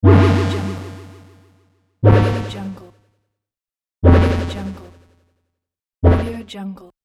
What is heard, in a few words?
magic protection; magicsoundeffects